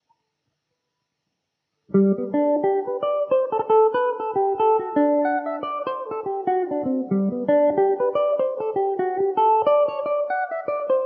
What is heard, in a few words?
dancing eyes gmaj mandobird, irish mandolin